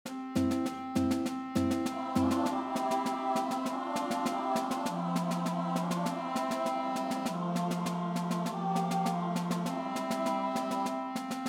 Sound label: Carved in Stone Bass